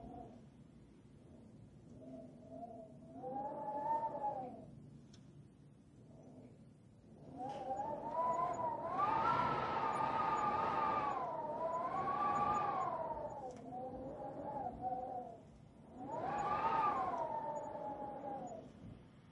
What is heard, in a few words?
wind